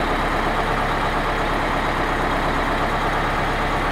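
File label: deafening noise of working tractor engine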